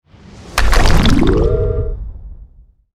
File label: Skill Rock crash; FlyForInsanity